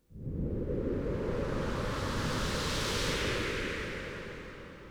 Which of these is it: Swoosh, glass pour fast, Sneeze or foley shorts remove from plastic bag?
Swoosh